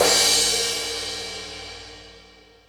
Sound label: More Cymbals, E-MU Pro-Cussion